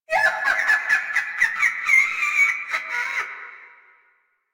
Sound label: Laugh, Grineer Queens Guards; Warframe